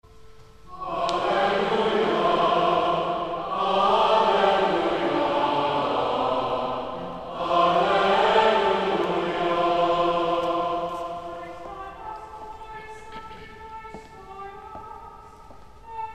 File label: Alleluia Mode viii